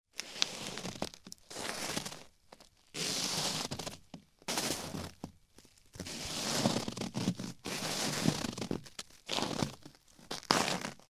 medlenno idet po glubokomu rassypchatomu

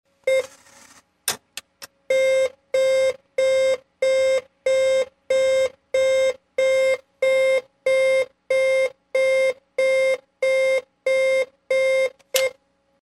signal preduprezhdenija bankomata chto nuzhno zabrat kartochku

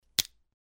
tresk slomannoj kosti

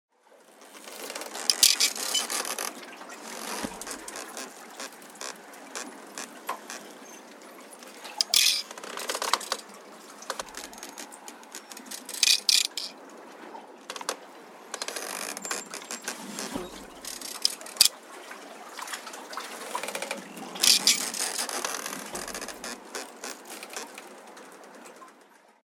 kanat na palube

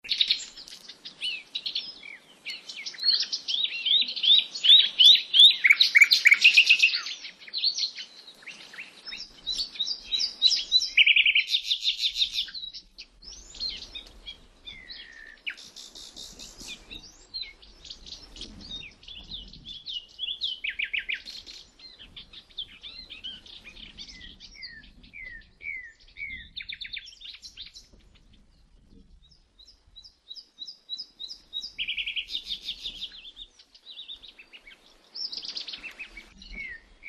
solovi na reke ili

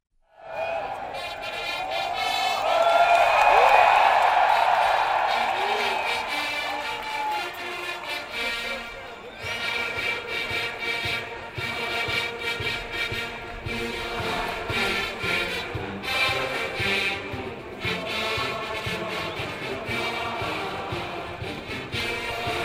atmosfera pered igroj vyhod igrokov muzykalnye zvuki; Звуки футбола; Звуки игры в футбол

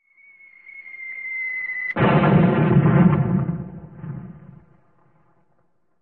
padaet bomba i vzryv

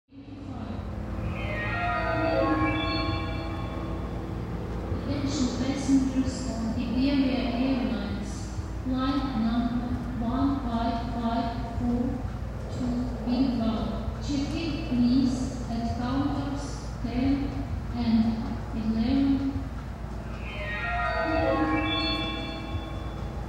zvuk vkljuchenija tablo i objavlenie; Звуки аэропорта

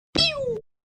piu; dlja bravl stars montazha

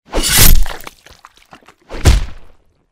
razrubili mechom i telo upalo; Звуки падения человека

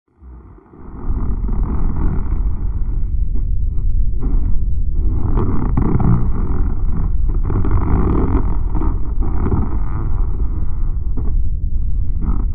zvuk treshhin v zemle

